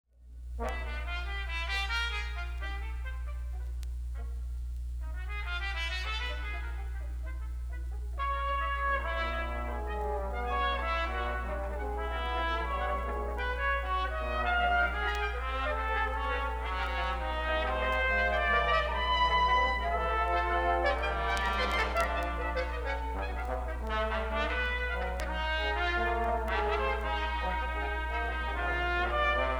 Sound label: brass quintet